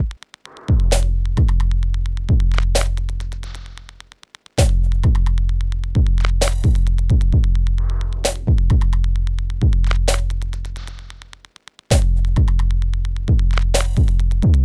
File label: Drum Loop 131BPM F